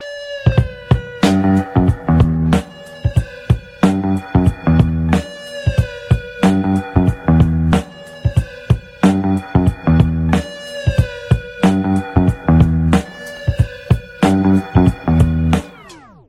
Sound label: hiphop email ringtone